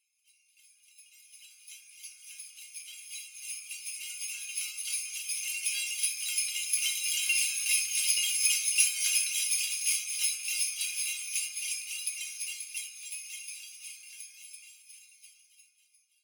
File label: jingle bells